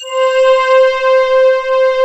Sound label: ELG STR C4, ELE STR